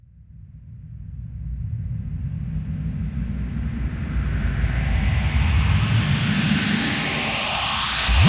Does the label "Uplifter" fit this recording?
Yes